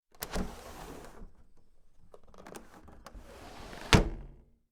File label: open and close window